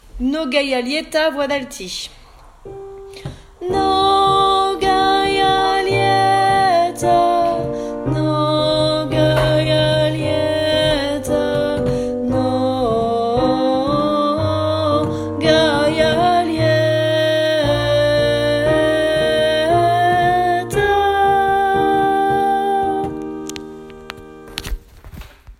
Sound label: Mnogaia Lieta Alti